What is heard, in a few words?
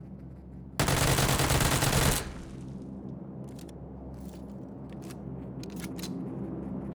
Uzi full auto